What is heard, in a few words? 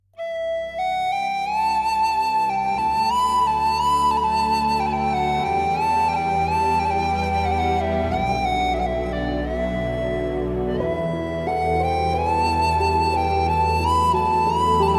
Sad Love BGM